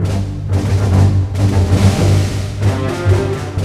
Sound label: BOLE, ORC Bolero; East West - Scoring Tools